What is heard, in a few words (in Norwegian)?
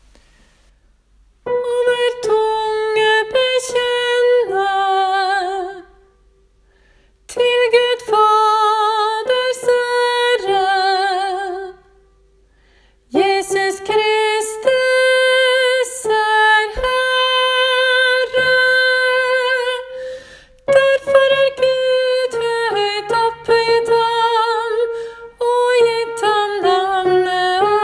tenor; kristushymnen side3; åfjord